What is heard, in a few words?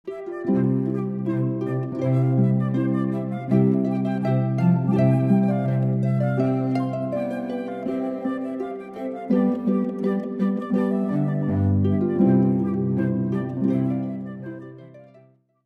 Fanfare, harp flute cello; Mouret